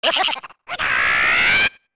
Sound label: Laugh; Gekko laughing; Bosses